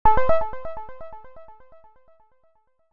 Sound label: Notify; GNOME